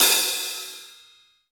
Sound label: Pop Open HiHat